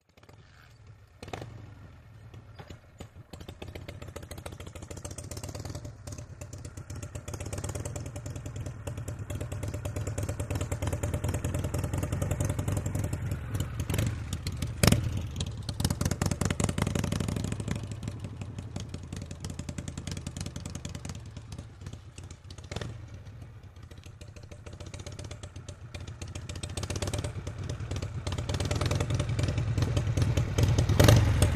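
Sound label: Motorcycle Harley Start; harley-davidson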